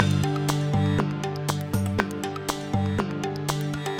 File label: wave